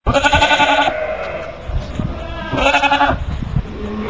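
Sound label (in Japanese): yagi, goat; 着信音やアラーム（目覚まし）音に使える、着モーションとして; FOMA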